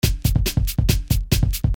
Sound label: first beat